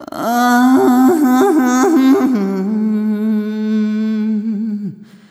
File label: Arrhythmic F